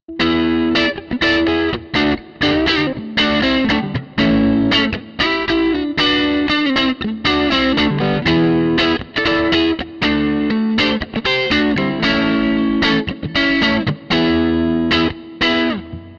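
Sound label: crunch neck fender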